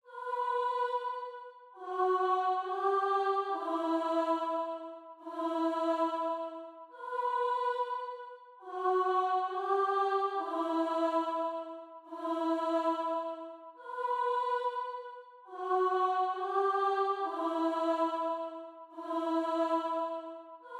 140 BPM E Min Choir, Drill; Cymatics; 2022 Melody Collection; Loaded

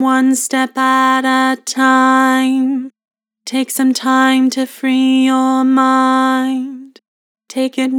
Vibrato, Vocals; One step at a time; Harmor Vocals

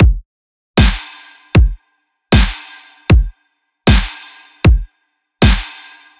Deathstep 155bpm; EDM Drums; Ghosthack; Drum Mega Bundle Demo; Ultimate EDM Drums; Deathstep Kit 20

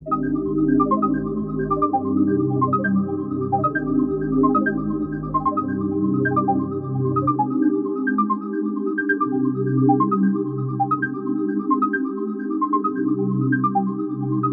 132 BPM C Min, Melody, Trap; Cymatics; 2022 Melody Collection; Trap Pt 1

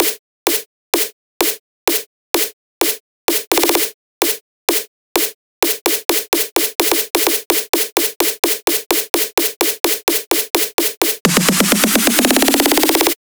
Drum Buildup, 128 BPM; Drums; Loops; House; Cymatics; House Starter Pack

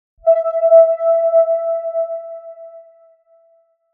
Chills

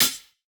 Hihat, Dubstep; Ghosthack

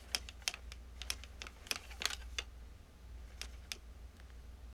Stethoscope; Fairlight Sound Lib

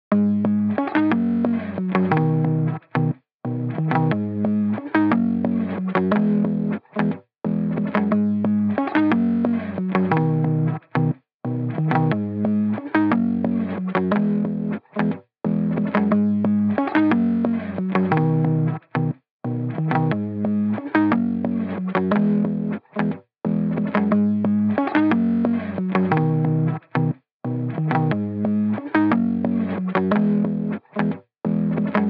120 BPM G# Min Stutter Guitar; Loop Stems; Cymatics; Mask

